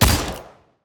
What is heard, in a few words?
shoot